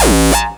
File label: noise 2, sound noise; NOISE+ALARM